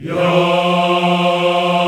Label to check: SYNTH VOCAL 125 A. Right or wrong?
Wrong